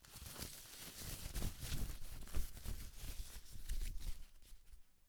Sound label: Plastic